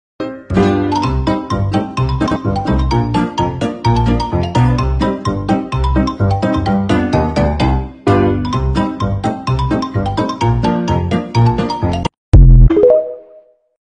cheeky music

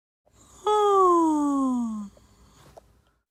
yawn

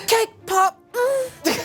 cake pop; smosh

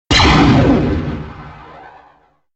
blaster; stormtrooper

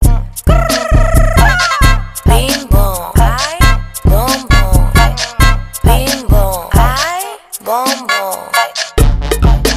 bong bong

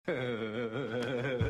laugh; masuka

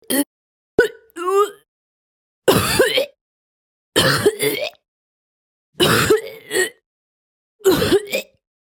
ugh, female

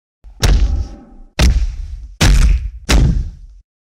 punch silat

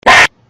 scream; hl2; meme

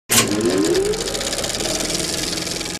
activate sound

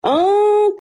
anhhhhh

